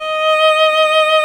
violin D#5; Greytsounds VOL-1

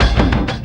Cym Drum, Drums, Frame, Kendang; Sonic Foundry (Sony Creative Software); Friendly Fire

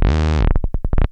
dance, bass

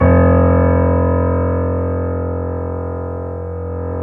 C7; East West; The Ultimate Piano Collection

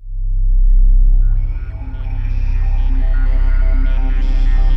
WS NL C 1, Korg Wavestation; Back In Time Records; Korg Universe VOL-1

